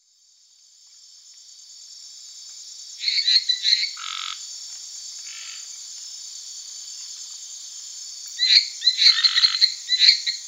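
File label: Hyla minuta, frog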